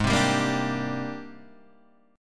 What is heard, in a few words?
Ab Major Sharp 11th Ab down Guitar Half Step Down; AbM#11